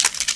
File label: xm1014 insert shell, weapons